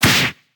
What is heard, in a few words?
Punch short